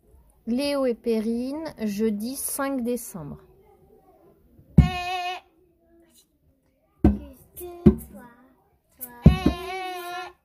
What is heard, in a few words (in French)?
creation musicale